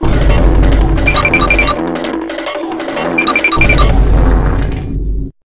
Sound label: Notes Unlocked; Acai